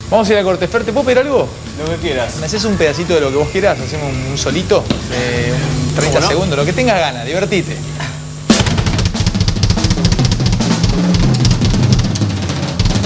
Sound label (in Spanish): solo de bateria